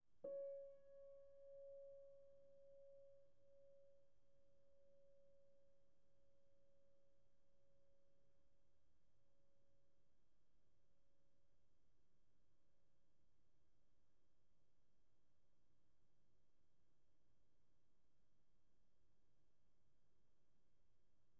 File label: Piano pp Db5